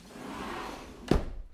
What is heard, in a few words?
door close